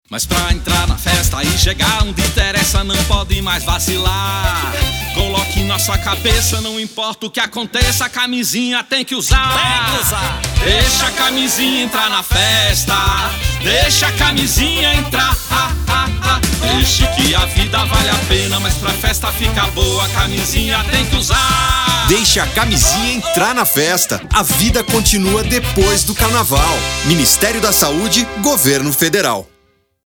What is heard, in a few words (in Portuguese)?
carnaval jingle; min saude aids